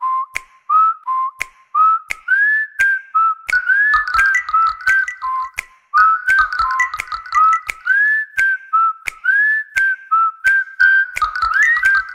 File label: Nova 7i Flipped Huawei Ringtone